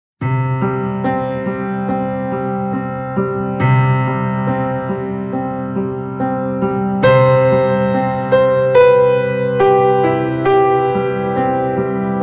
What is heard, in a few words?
piano and ambience